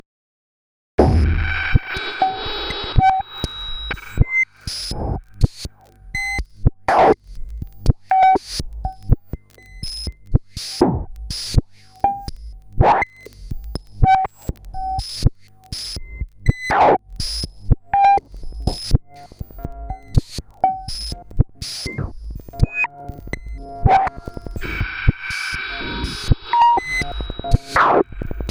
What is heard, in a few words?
mute loops